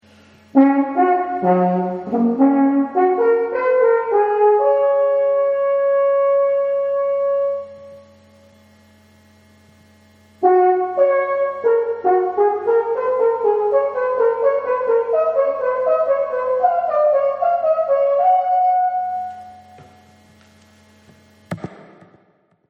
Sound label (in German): siegfriedsruf kurz, alphorn